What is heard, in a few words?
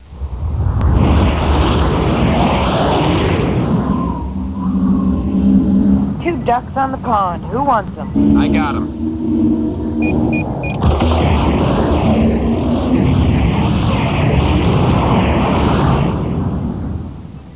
ducks; TWC Clan Site; JKDF2